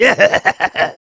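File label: laugh evil, German; Demoman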